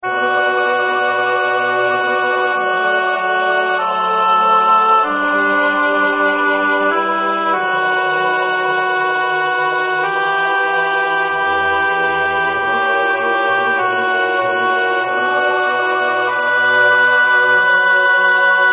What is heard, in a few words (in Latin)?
Crucifixus Alto; Zelenka; Missa Paschalis